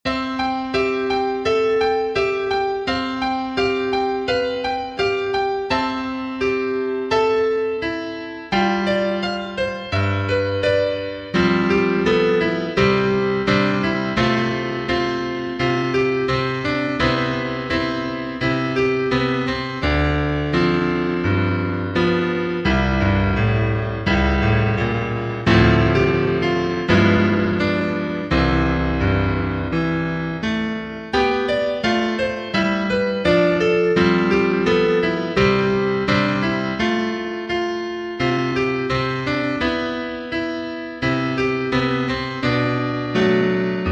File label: Playback piano; Tempo 1